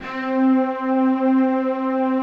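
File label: SYNCLV STR 3, Synclavier strings; Greytsounds VOL-1; SYNCLAV STRG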